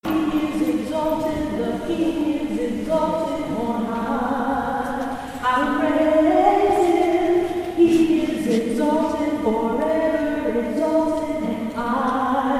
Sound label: SOPRANO